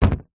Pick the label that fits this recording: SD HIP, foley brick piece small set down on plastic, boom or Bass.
foley brick piece small set down on plastic